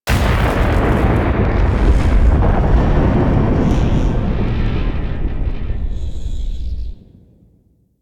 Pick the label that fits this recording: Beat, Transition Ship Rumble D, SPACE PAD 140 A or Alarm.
Transition Ship Rumble D